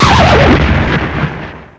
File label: putt bang